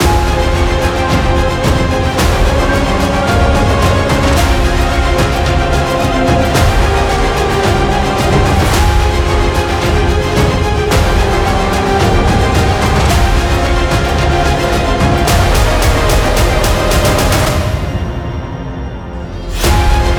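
tension cinematic, horror excitement thriller song; By Burak Sound Bank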